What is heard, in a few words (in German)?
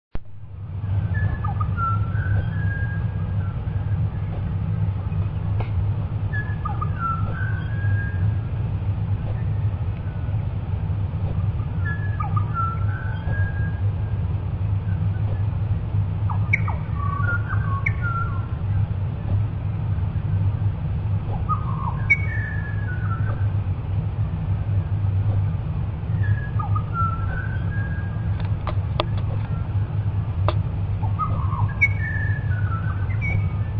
Vogelstimme im Outback Australiens lang